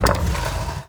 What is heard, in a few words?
Warrior Statue Bust Place; Puzzles